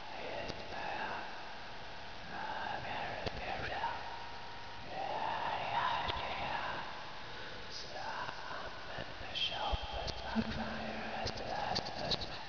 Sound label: backstage